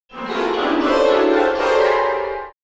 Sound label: Old vintage cartoon danger